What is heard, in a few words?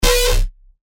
BSHOT C; Limit; revealed bass shots vol 3